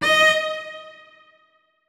notes, keyboard; Hideout Furniture

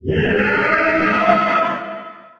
attack, poltergeist; Soundscape Overhaul; monsters

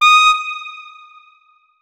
notes, saxophone; Hideout Furniture

